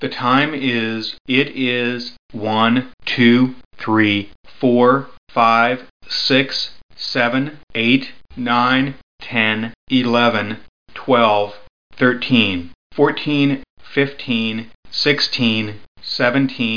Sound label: Male